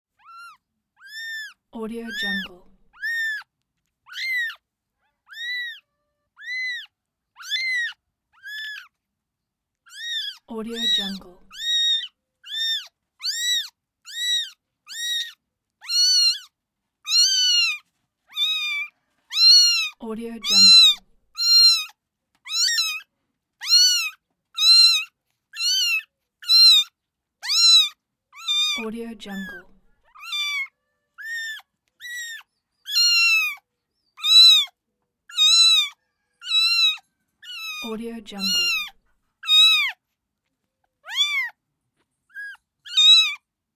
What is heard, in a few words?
kitten meows; moonaudioproduction